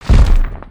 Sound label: Dragon Wing Flap; creatures organic